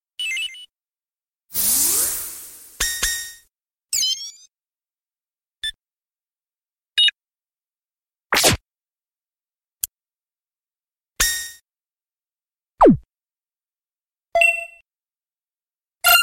ui tones; dmmd

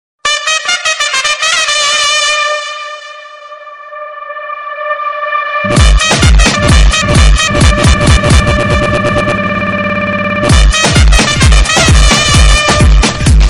Dj Mix

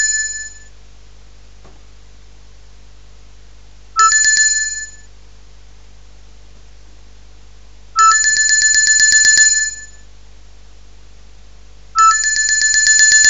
Ring

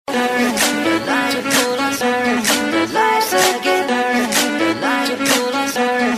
Message Ton

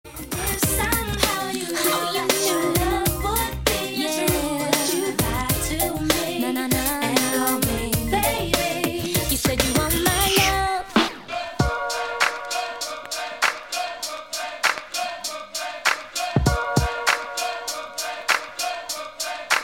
Dance Mixes

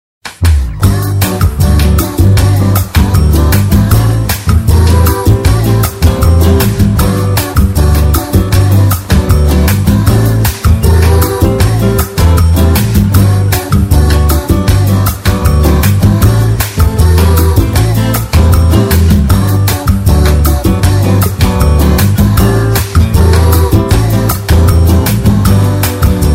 Bossa

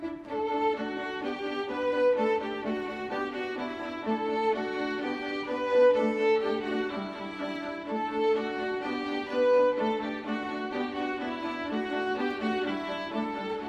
Orchestra; Fable

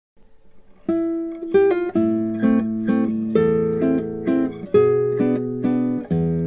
Game Over

